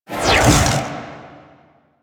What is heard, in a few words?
Glaive Charge; Enemies; Weapons; Warframe; Teshin; DE